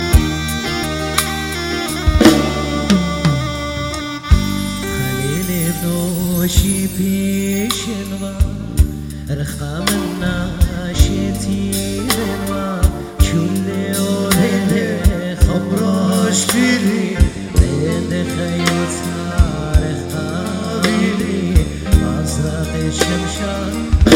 Slow Music

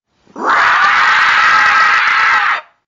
scream

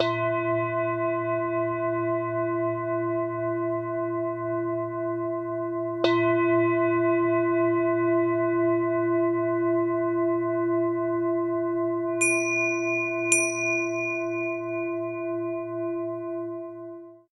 Singing Bowl Tingsha

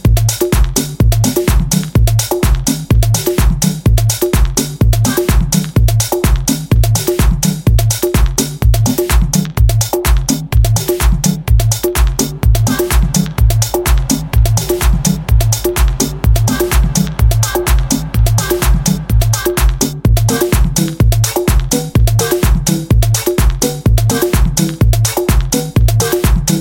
More Percussion

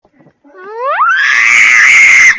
Reeeeeeeeeeeeee

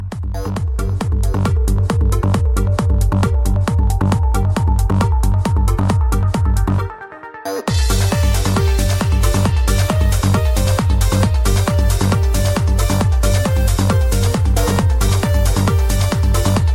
Beats

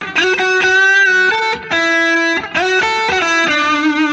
Flamengo Guitar

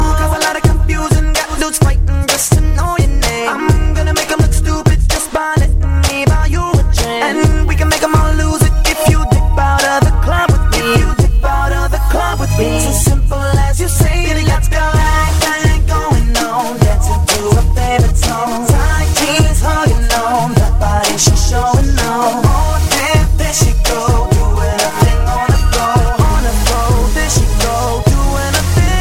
Club

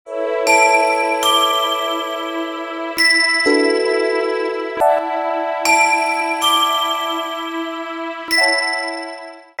Sms Tone

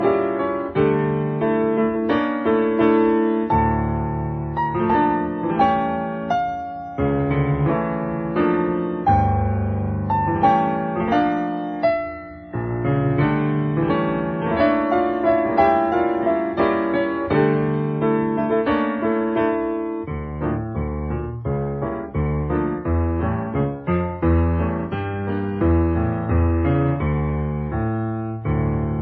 Piano Iran Classic

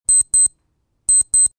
Old Nokia

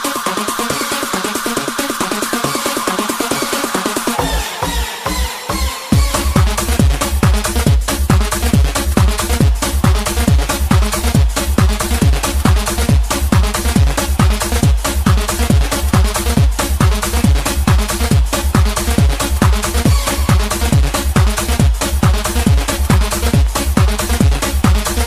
Hard House

